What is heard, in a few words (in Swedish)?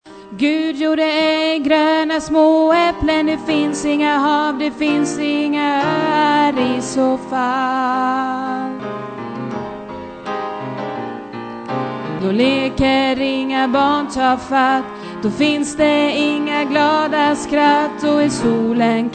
alt, stammor